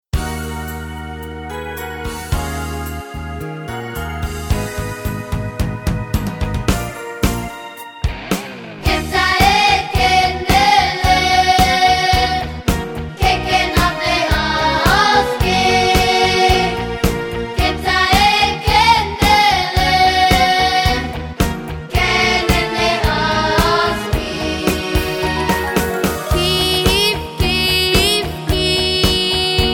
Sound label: niggun